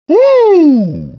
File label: woo